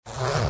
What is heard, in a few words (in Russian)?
Zvuk zastegivayuscheysya molnii